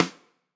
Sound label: Rim